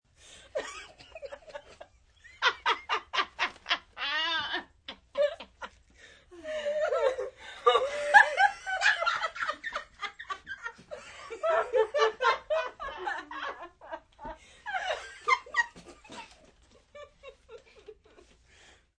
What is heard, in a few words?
Kids Laugh Girls, Laughing, small group of teenage girls. (3-5 girls) (human voice)(stereo); Laughter